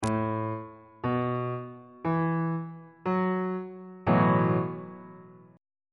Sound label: b dominant 7th suspended 4th 3rd inversion on bass clef